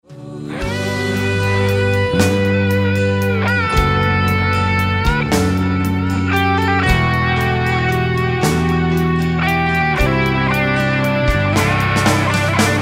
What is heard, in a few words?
solo